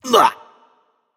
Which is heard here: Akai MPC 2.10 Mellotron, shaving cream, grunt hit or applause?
grunt hit